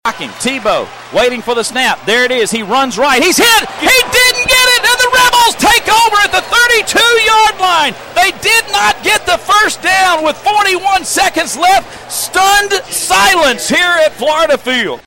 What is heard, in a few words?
Stunned Silence Florida Field